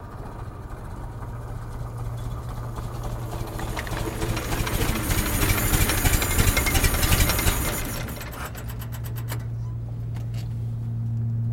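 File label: Antique Car Stop Start, antiques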